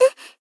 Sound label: Polite Damage Uu